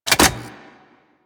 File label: Flamethrower Reload; Warframe; Weapons; Ember Deluxe II Flamethrower Skin